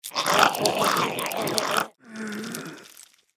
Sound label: feral ghoul seizure short; npc